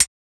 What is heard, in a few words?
Hihat Closed Heavy